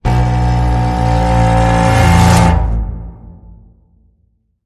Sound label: Tool Bend